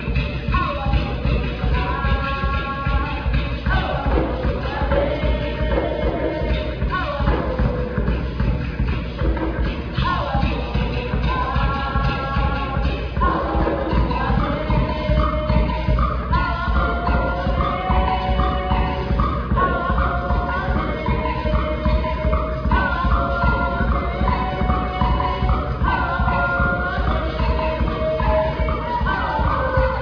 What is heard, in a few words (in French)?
chant